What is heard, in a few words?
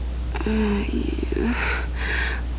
MOAN